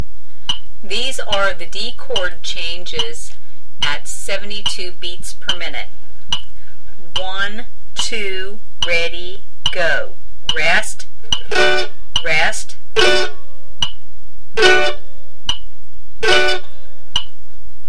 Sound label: D Chord Changes 72bpm, mandolin